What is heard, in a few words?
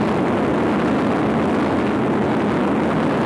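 missile loop, rocket; missle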